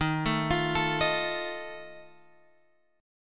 Eb Suspended 2nd Flat 5th Eb Guitar Open G

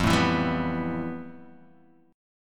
F Major Sharp 11th, Guitar, Open D

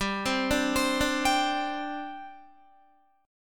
G Suspended 4th, Guitar; arpeggiated; 4F,4A,5C,5G,5C,6E; 14,15,14,17,14,15

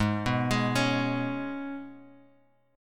G sharp Minor Double Flat 5th G sharp; {4 2 X 1 2 X} arpeggiated

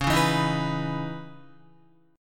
C sharp Minor 9th C sharp Guitar; C#m9 strummed; 8,4,4,8,4,7; JGuitar

